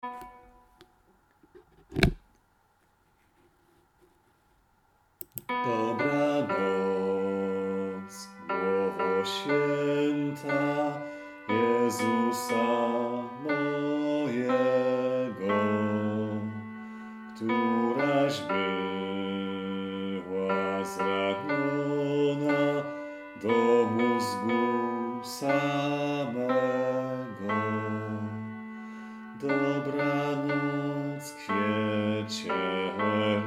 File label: Tenor; Dobranoc Glowo Swieta; Wielki Post